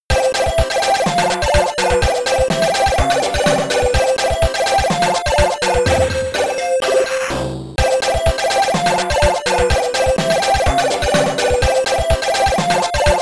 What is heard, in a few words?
Loading Tune